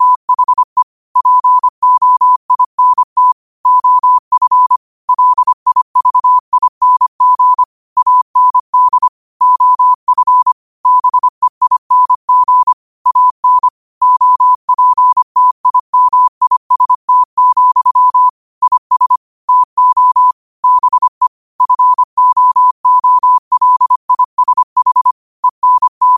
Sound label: 5 wpm, morse